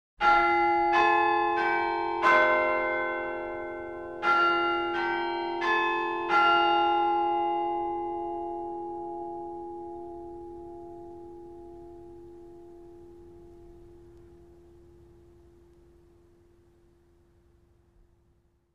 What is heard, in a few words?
School Bell, bell towers; St Pauls